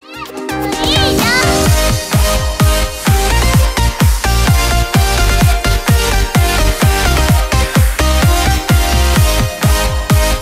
IPHONE 13 PRO MAX RINGTONE

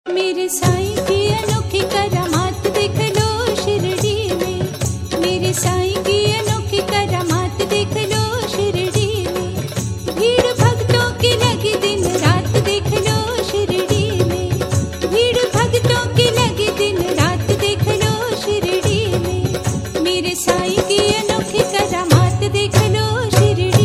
RINGTONE

